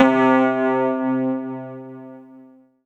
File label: C4, Synth Line; NiceBeats